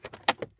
case open; Escape from Tarkov

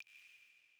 water drip light; Rust

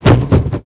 bodyfall metal

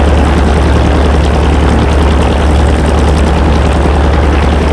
bearcat rear, engines; War Thunder

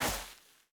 Personnel Footsteps Sand; DCS

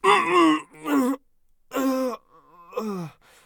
agony; Escape from Tarkov

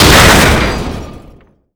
metal door explosion; Explosions

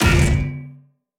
damage hits explosions; World Of Warships

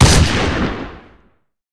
ns45 singleshot, guns

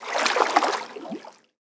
Amb Weather; Red Orchestra 41-45

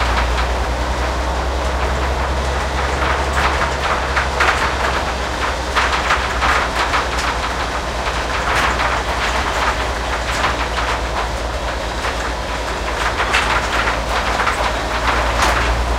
wheels speed 99, tanks; engines; War Thunder

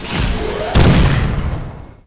Legion Power Shot; Titanfall 2

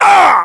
pain, UK, voiceovers; english

